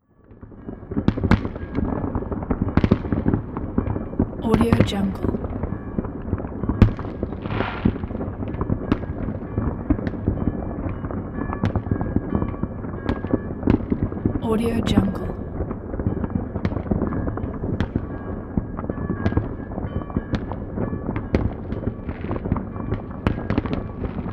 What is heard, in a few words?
fireworks with church bells ambience